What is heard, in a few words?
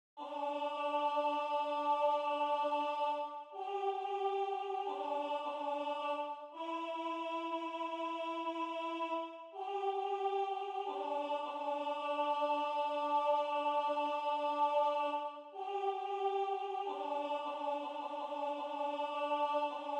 Alto